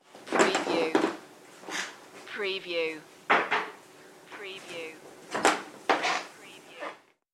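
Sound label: Footsteps Creaky Floor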